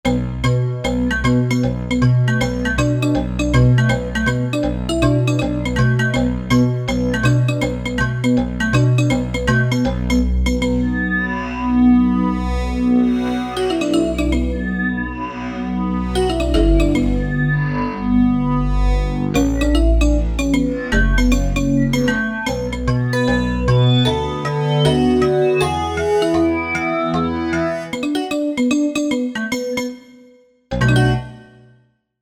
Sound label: Wave Mallet